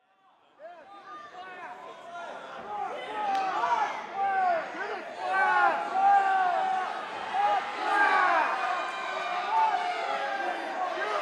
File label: match with crowd